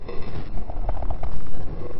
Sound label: loop, techno atmos